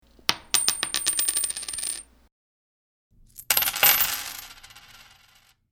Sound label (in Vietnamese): Tieng dong xu roi